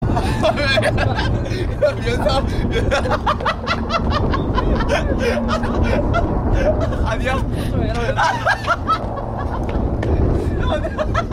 Laugh

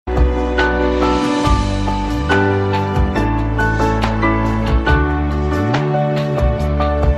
Piano Chop

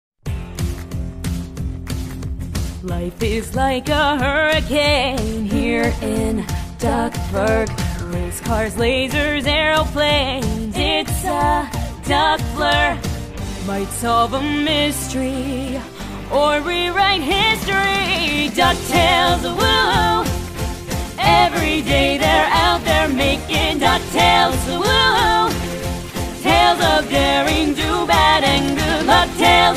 theme song

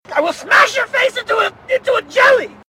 Smash

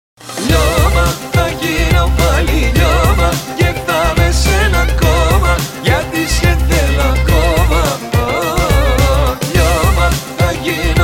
Vocal

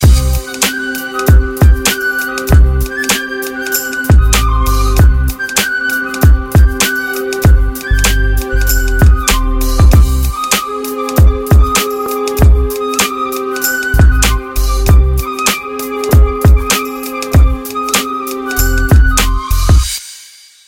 Hungarian Rap Beat

